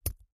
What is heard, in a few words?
Fingers and bone being broken. Mutilation, Body Dismemberment, Gore Break, Bone